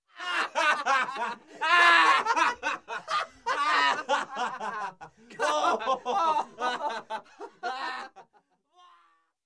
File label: Men Hilariously Laughing